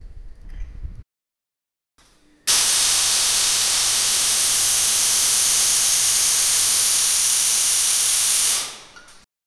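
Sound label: sizzling welding sound, workshop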